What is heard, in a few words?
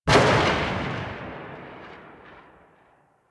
Tail Generic Exterior, Weapons